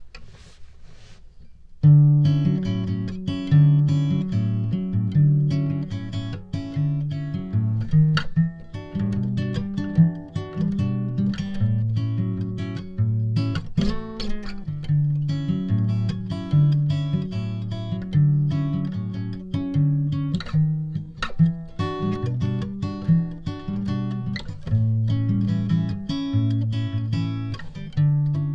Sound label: Guitar